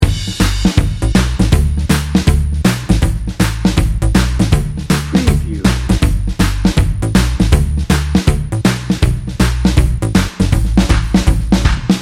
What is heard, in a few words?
sunny spot dnb loop